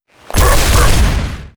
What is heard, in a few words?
scholar atk; monster